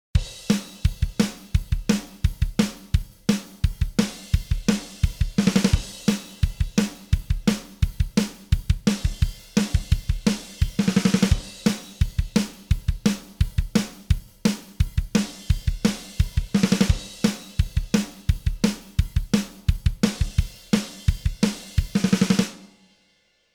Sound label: drum off